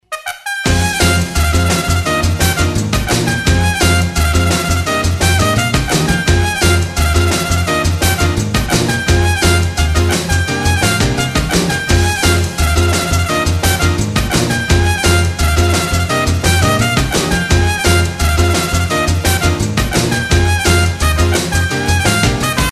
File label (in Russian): Otbivka komandy KVN; отбивки